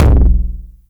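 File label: 909 low tom, tape saturation; Toms; Goldbaby Tape808 & Tape909; The Tape 909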